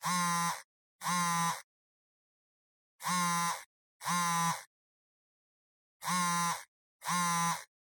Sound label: Cell Phone Vibration Hand